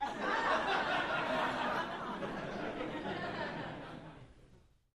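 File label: Crowd 100 Short Light Burst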